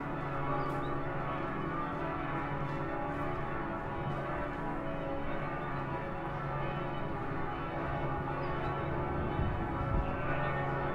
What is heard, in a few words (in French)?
cloches